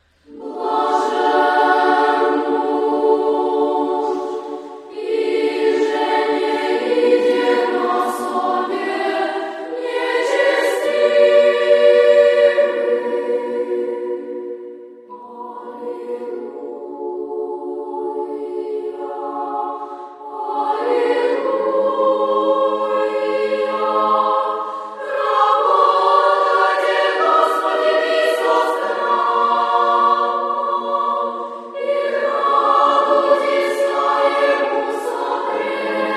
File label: Childrens Choir; Blessed is the man; St. Eliyah Childrens Choir; We are Guarded by the Cross